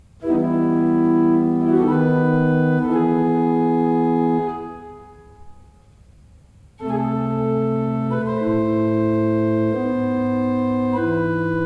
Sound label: John Fearnley, pipe organ